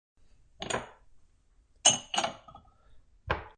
A glass clanks onto another when stacked.; Stacking a Glass; Glasses

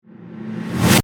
Riser Reverse; Riser, To, Lfe, Production Element, Imaging Element, Accent, Transition; Low Impact; BlastWave

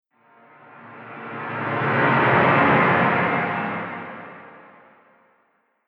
Whoosh Scary Horror; Whoosh Sound Effects; sound design

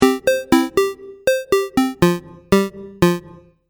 Game App Tune, Video Game Sound Effects; Twistedtracks

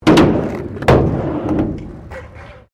SKATEBOARD HALFPIPE WOOD; Skateboard sounds; Skateboarding; Sound Effects:Sports

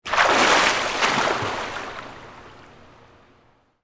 Pool Indoor Surface, Water, Splash; Pools; BlastWave

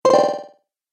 Retro Videogame Fx Error Sound

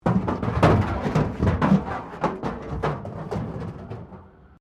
METAL BIN SCRAPE PLASTIC CRASH BARRIER

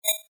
Rollover, Short

